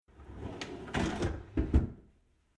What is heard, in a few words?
Household Domestic Kitchen Big Draw Modern Sliding Open Light Metal Rattle; Kitchen Sound Effects